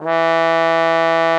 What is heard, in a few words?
E3, TROMBONE; Xsample VOL-3